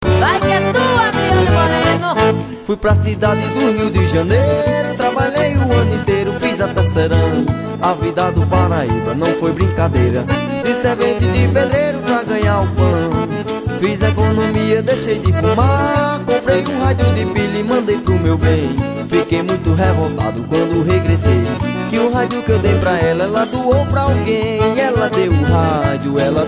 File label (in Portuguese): radio de pilha